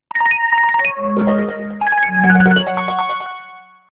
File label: message alert